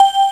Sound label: Fanfare; SNES